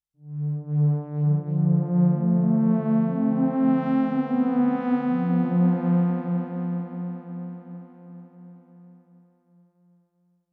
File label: Xpander, synthesizer